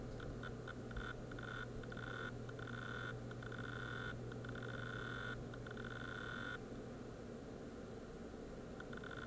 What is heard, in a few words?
hd sounds, laptop, bad; mac disasters